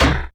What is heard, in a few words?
Yamaha RX-120 FM Perc